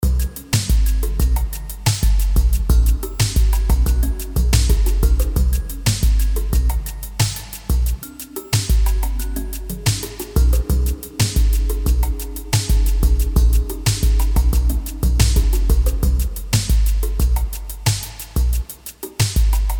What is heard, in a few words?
beats